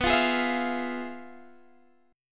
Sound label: F Minor Major 7th Flat 5th, B